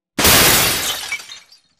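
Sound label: glass break mid distance; Titanfall 2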